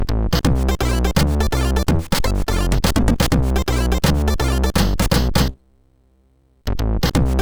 Su-Sweet, MOS6581R2; sid

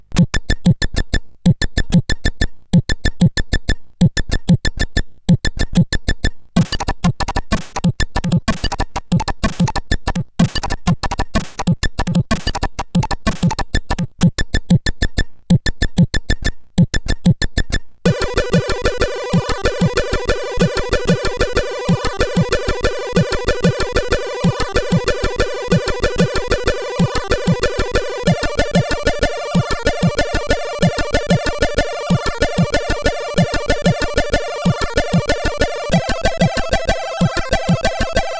Bongo Rythm, sid CSG8580R5

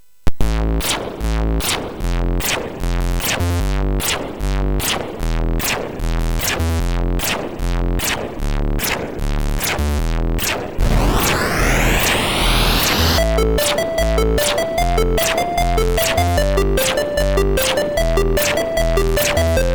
Freak One, MOS6581R3; hvsc

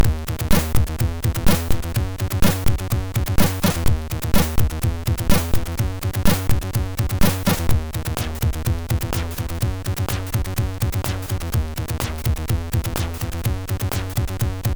Techno Dream, MOS6581R3; hvsc

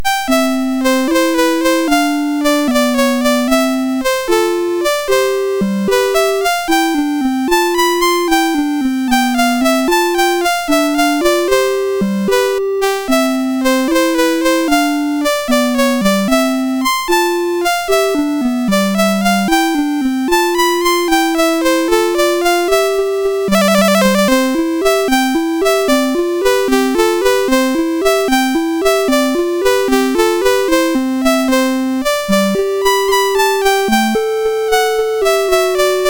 disco, MOS6581; MOS6581R4

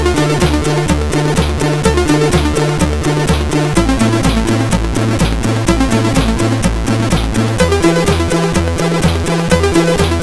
Intro sid MOS6581R4; Defbeat Collection 2; hvsc

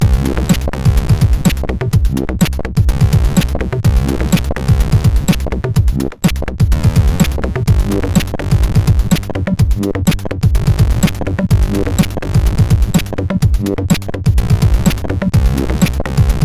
Loader Music, CSG8580R5

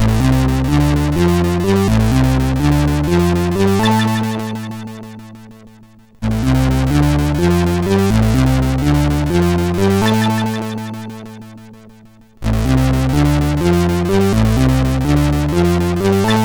sid, MOS6581R3; Lupo Alberto

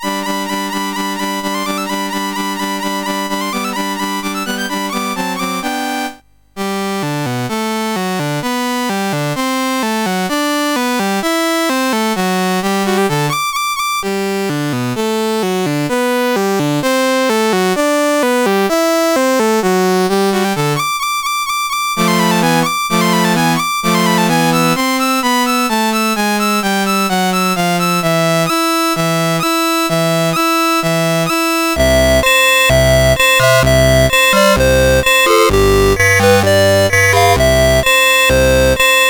Anvil Chorus, CSG8580R5; Anvil Chorus B; Dave Marquis

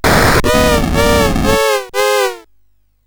Hardsync, sid CSG8580R5

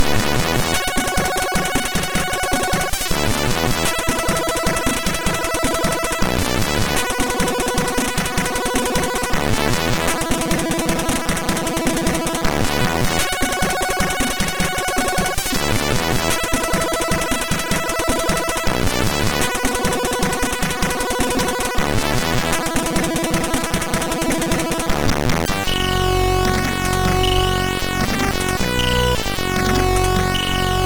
sid MOS6581R3; Walker Martin; Ninja Spirit

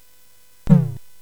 Dark Castle, CSG8580R5

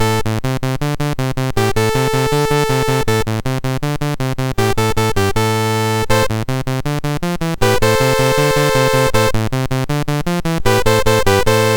Spy Music, sid MOS6581

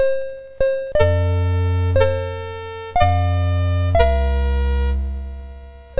Happy Birthday, MOS6581R2

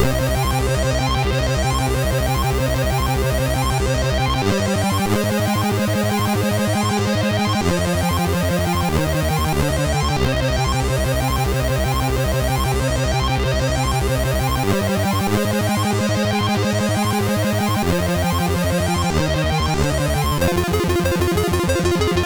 sid MOS6581R3; Lethal Zone

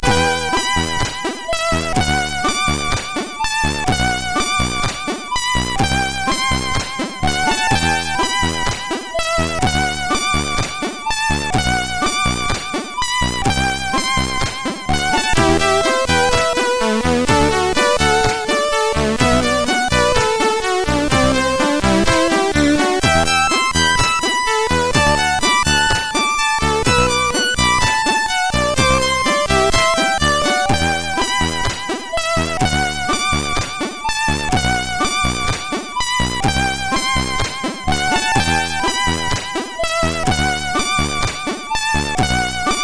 Polyphony, CSG8580R5; hvsc; Extern

